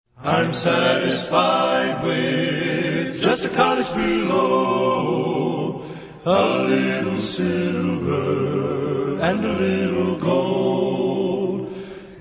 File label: a capella hymn